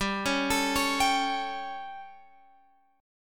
G Suspended 2nd Flat 5th G Guitar Open G

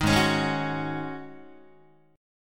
Ab 7th Suspended 4th Ab down Guitar

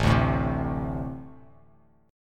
A Minor Double Flat 5th A; Ambb5 strummed; 5,5,7,5; JGuitar

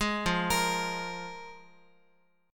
F Minor Double Flat 5th F Guitar; Fmbb5 arpeggiated; JGuitar

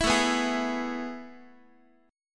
A Major 7th Suspended 2nd, Guitar; AM7sus2 strummed; x,x,7,7,3,7; JGuitar

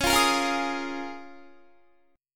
Db 6th Add 9th Db down Guitar; Db6add9; strummed

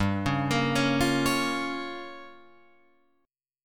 G Minor 7th Flat 5th, Guitar Open F; Gm7b5; arpeggiated

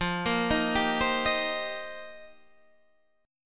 F 9th Suspended 4th F Guitar Open D, chord

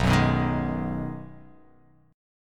B 6th B down, chord

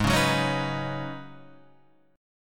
C sharp 9th Suspended 4th G sharp, chord

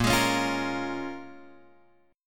Bb Minor 7th, Guitar, Open F, chord; Bbm7 strummed

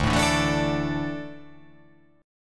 D Suspended 2nd D down

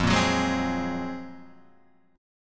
Gb Major Sharp 11th F

